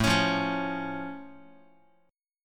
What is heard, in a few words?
A Augmented A down Guitar; strummed; x,5,4,3,5,x; JGuitar